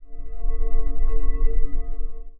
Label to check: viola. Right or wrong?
Wrong